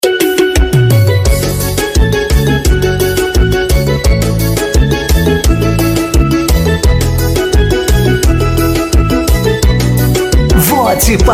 jingles politicos pisadinha, Paródia Para Prefeito E Vereador; jingles politicos 2020

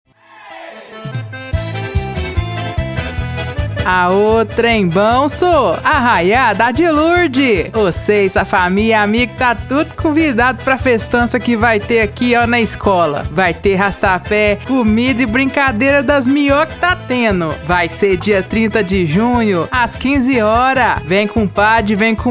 caipira

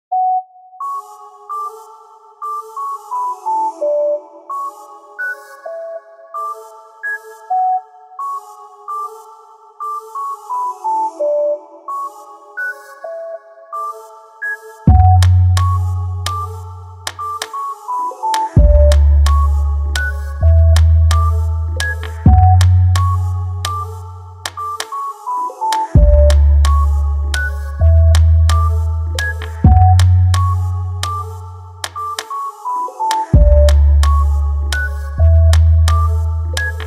base de funk; Base De Funk 16

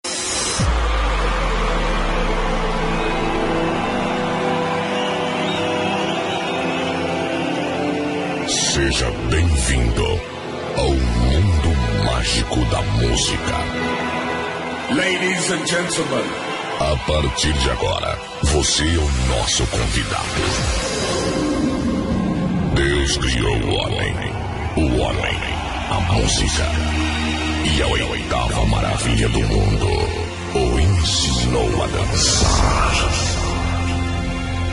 funk gospel

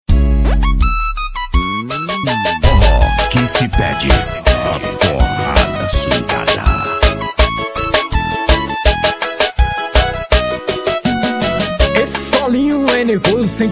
forró

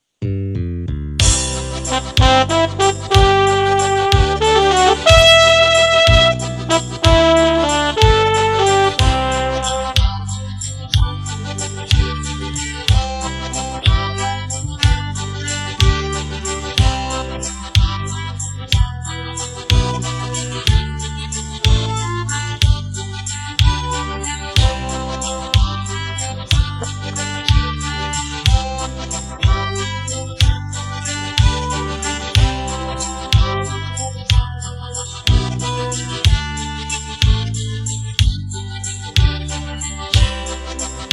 karaoke valsa

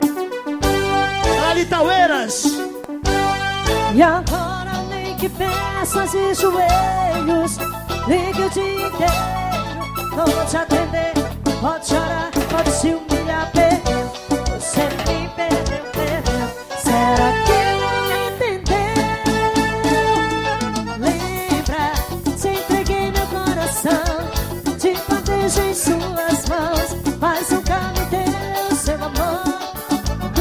forro ao vivo

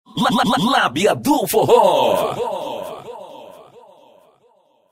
vinheta

